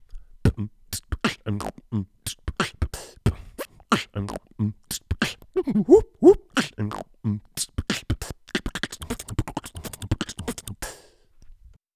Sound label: How to mix your beatbox RAW Track; Lyrebird Beatbox School